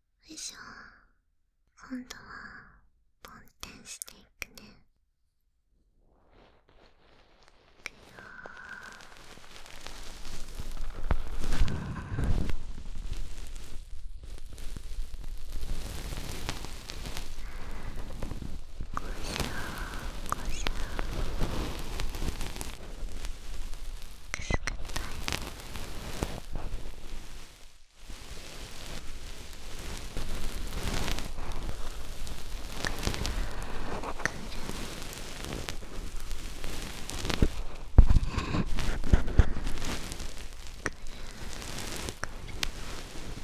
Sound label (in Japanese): ぼん天と耳ふー; 彼女が寝かしつけてくれるASMR～耳かき、添い寝、心音～